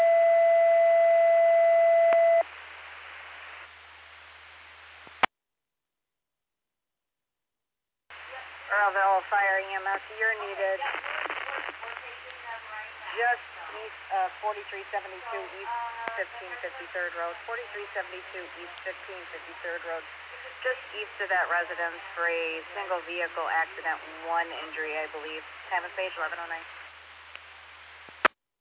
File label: Fire; Earlville; TTDAlerts